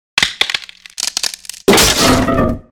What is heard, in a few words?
wood pull; Undertale Yellow